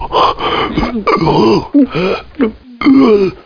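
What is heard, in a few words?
choke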